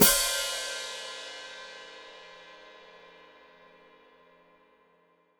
Hat Closed Open, Hi-Hat

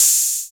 HH 808, TR-808; 90 s Sample CDs; Sound & Vision - Gigapack I CD 1 (Roland); TR-808 & 909

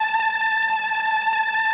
ORCHESTRON A3; Sonic Reality; Vintage Timetraveler

